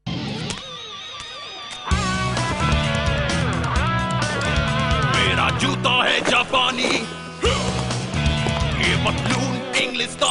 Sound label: Hindustani